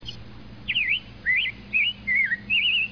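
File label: rose-breasted grosbeak, birds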